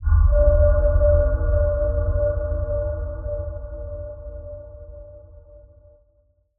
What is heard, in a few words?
Abyss, Deep Atmospheric Sounds and Soundscapes; Bluezone